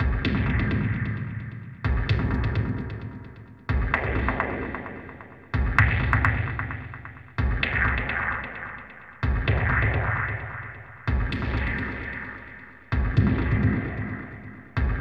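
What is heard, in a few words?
ambient bass drum, fx loops; Zero G; Malice In Wonderland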